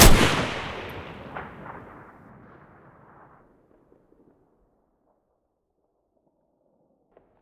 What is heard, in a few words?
rpk shoot stereo, weapons